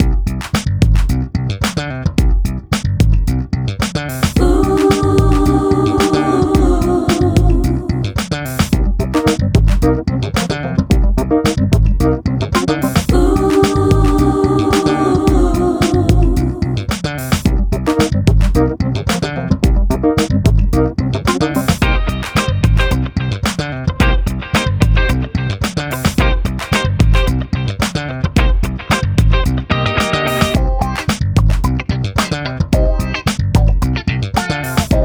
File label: Hip Hop Dance